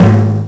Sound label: Timpani (12854Hz); Sonic 3; M8; Genesis